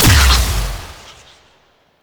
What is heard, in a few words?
Fire Medium; Duviri; Incarnon Adapters; Weapons